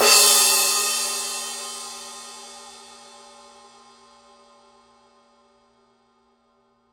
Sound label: power crash edge; paiste 2002